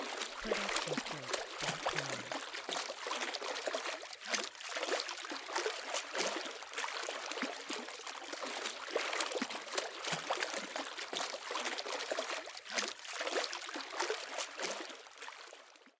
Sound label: swimming water splashes loopable; soundscrate